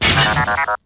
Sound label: probe get hit; MOTS